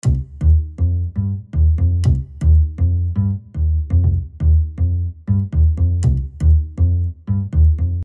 Upbeat Upright Bass Loop 8 Bars; Agent A: A Puzzle in Disguise; Windows

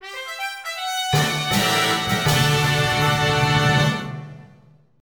Jingle Bonus Get

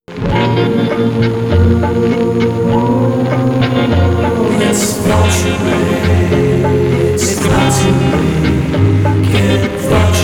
Vulture Aid Perk-a-Cola Sting; Buried; Call of Duty Zombies; Treyarch 2008

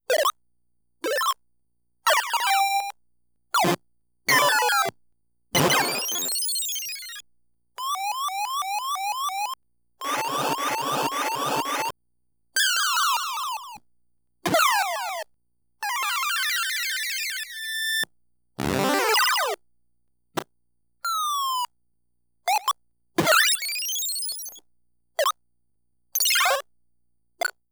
Sound Effects Collection

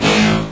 mecha; misao

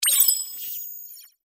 Technology Notification

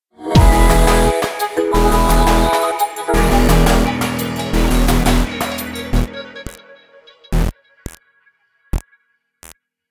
Fight Low Combo; Remember Me

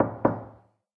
knock; misao

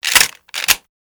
shotgun reload

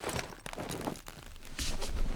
Climb Up; Lucius

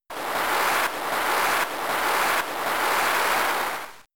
Water Sport; Pokemon; Gen 3; attack moves; RSE

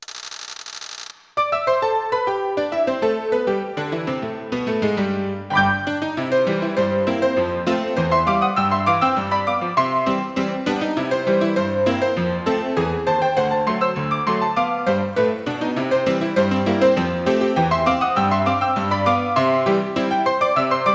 Player Piano; the colonels bequest 1989

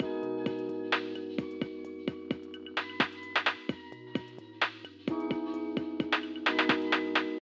Elevator music

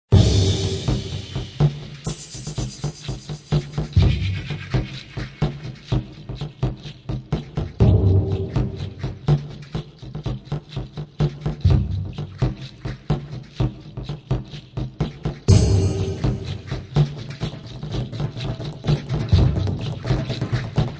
Background

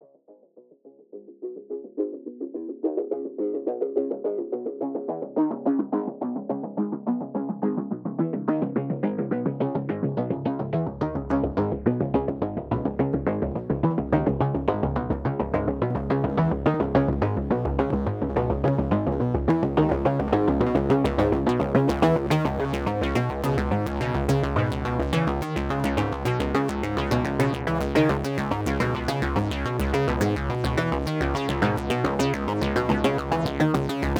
Synths

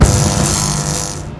MAINBOSS ATTACK; guns n zombies 2014 pc

